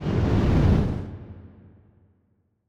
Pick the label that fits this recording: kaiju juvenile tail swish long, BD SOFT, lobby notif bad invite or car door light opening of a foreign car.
kaiju juvenile tail swish long